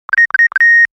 trio ring; ringtones

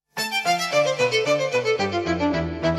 Love Violin Sound

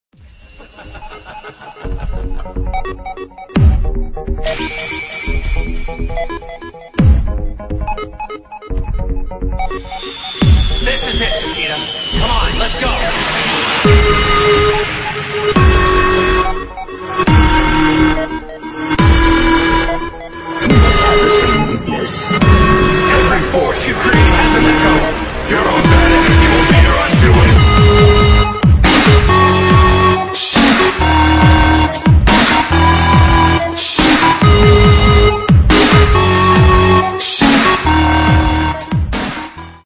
dubstep

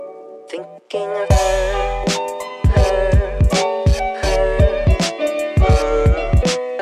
Vaporwave

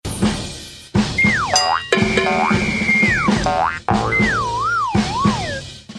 Sound FUNNY

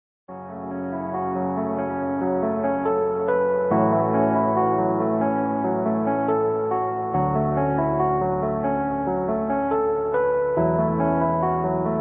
dubstep

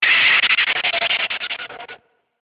brake stopping

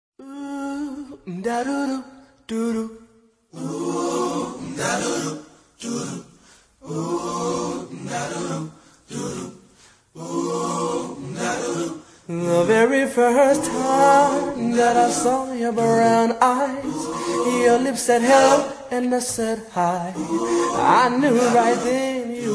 acapella

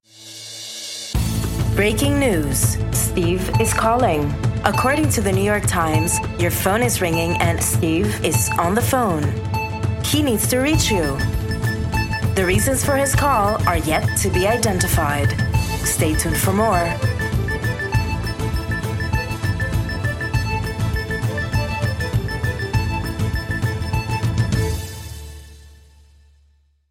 Jazz

